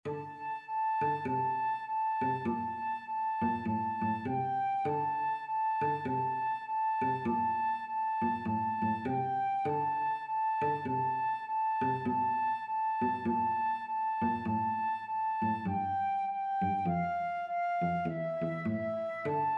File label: flute cello duet